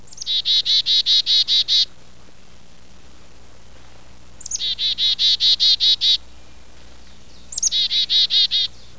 chickadee

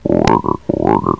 frog

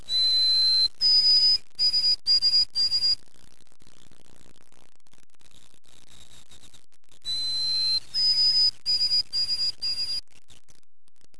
white-throated sparrow

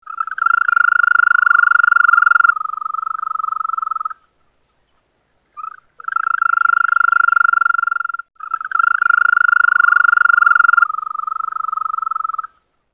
Green Toad; nature; DKMM Nature

